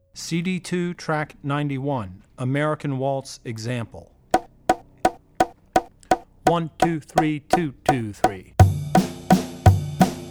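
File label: Wedding Dances - American Waltz, Drum Tracks; Drummer's Bible Tracks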